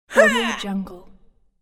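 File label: girl combat shout; human sounds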